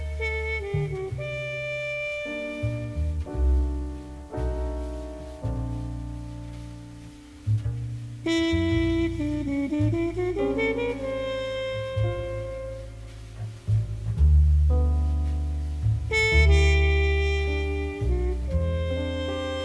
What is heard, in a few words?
jazz